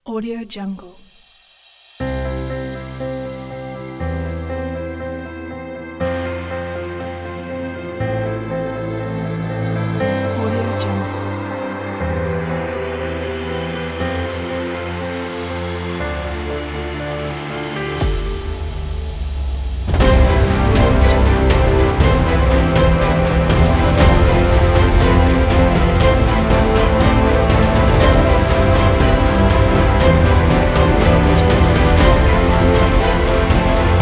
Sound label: inspiring epic trailer; absound